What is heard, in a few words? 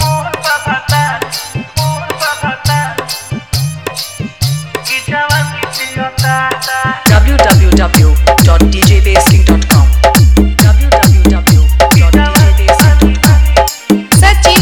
Hard Vibration Bass Mixx